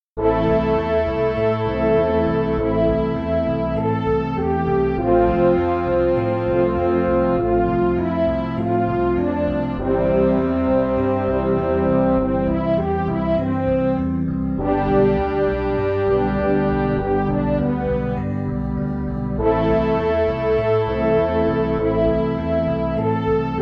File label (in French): orhestral